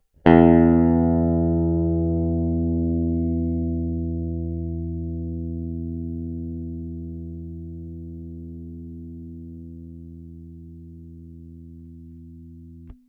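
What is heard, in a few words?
RECORDED str6 bridge pickup, electric guitar; mf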